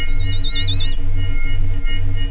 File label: ufo; World Racing; XBOX